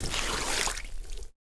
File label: Mad Slug skill; Seer; GamigoNA